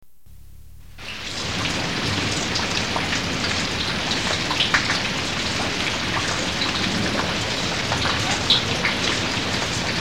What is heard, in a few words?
Slow Rain